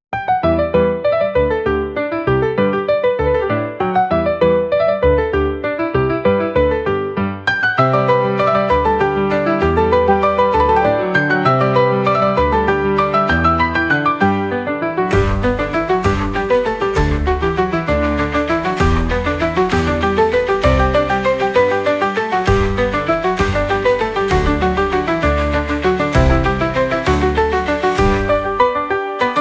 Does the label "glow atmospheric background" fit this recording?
No